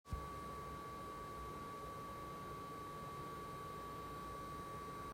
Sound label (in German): GD711 normal Modus